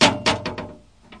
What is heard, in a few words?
squeakybox; Weird and Interesting Hits